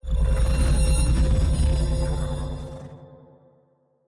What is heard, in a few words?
psionic aura; Stellaris; shroud